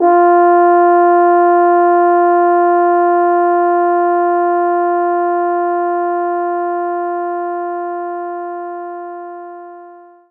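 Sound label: French Horn mf F3; knights try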